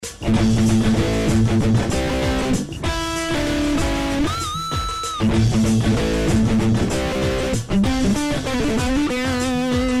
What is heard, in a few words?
riff dist, guitar; SMB Tube Talisman; канал-classic